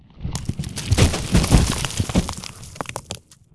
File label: fall down